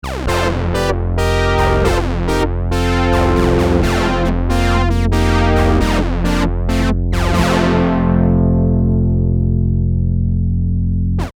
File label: Sync Blow, Prophet '08